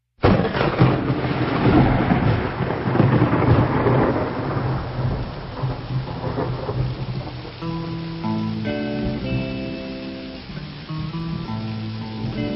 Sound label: Blues